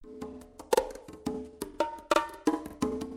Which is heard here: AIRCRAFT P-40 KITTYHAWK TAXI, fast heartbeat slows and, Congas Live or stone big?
Congas Live